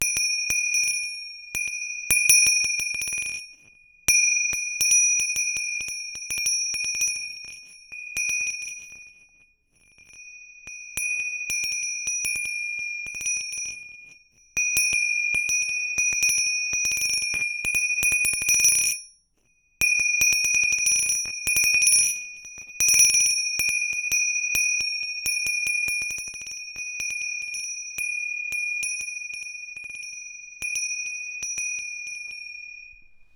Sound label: Chinese Bells; Percussion Samples